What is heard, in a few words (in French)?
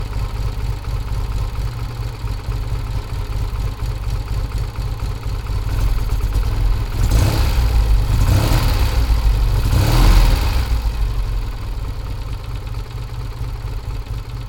Triumph Bonneville culbuteurs